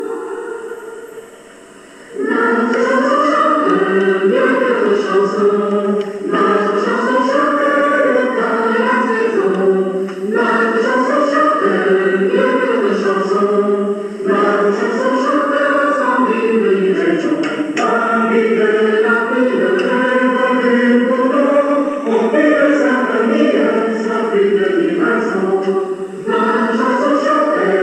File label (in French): notre chanson chante